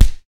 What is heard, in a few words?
punch